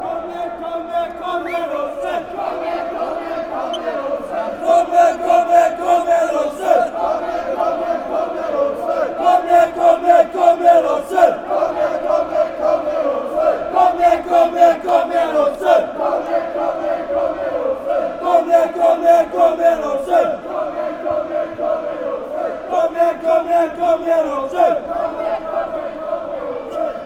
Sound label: chant; aalesunds